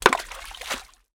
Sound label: impact stone hit water rockpool beach splash